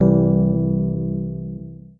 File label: Notes Elec Piano C Major; Scratch game maker software